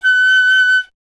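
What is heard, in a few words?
flute piccolo F#; Miroslav Vitous - Solo Instruments VOL-2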